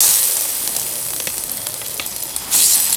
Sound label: short cooking sizzle ui sound; food drink